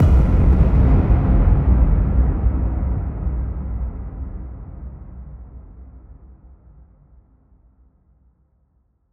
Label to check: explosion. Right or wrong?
Right